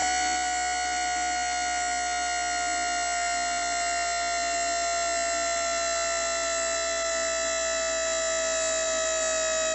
Camcorder Zoom Out; Samsung; GT-I7110 Pilot

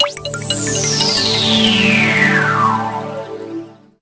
Shutdown Melody; BenQ-Siemens S68

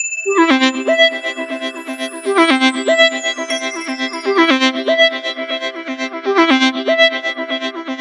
Lunar Tone; Samsung SGH-T839 Sidekick 4G; ringtones

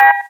gayageum zero, keytone

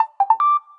Message tone; Samsung GT-C3530; SMS

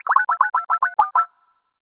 touch tone dial Up, WiFi Phone; Netgear; SPH101

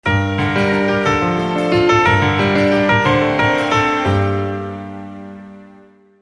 Rings; PlusFourSix; Sania; Lars Edvall

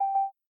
Video Stop, ui

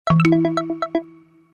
message, notifications; Gionee; Pioneer-P1

